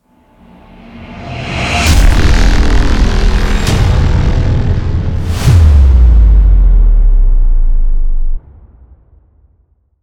dark engine logo

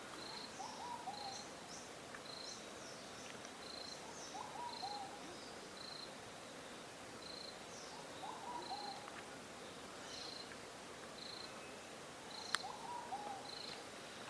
Ruddy pigeon; کبوتر گلگون

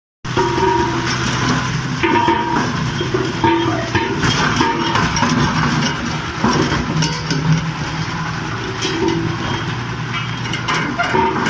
Road roller

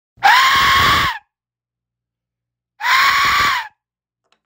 Aztec Death Whistle; سوت مرگ آزتک ها

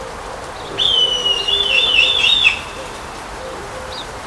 Red kite; پرنده کورکور حنایی

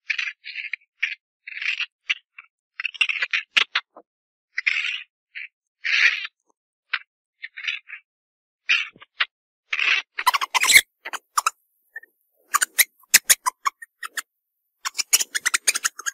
Mongoose

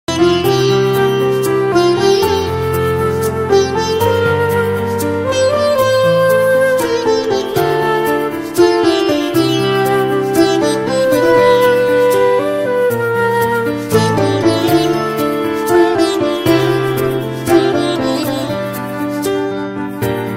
Sitar

